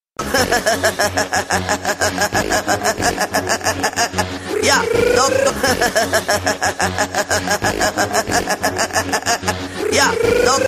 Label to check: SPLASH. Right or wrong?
Wrong